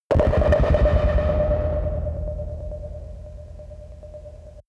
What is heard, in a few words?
tonal stutter; alarm; soundpool